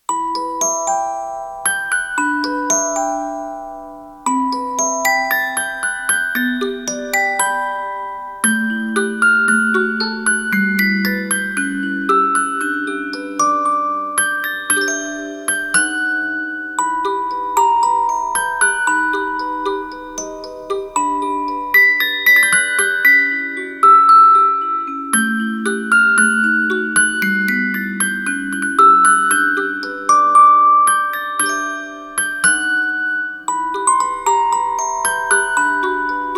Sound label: Orgel, soundtrack; tree of savior